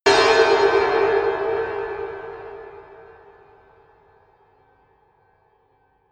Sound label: bell hits horror